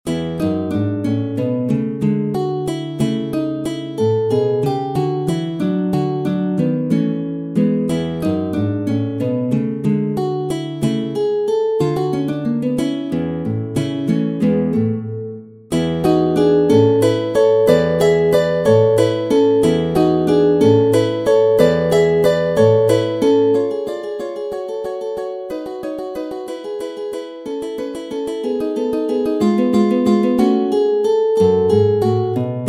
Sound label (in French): Andante Grazioso, Méthode pour la guitare; Ferdinando Carulli; Op. 241; Classical guitar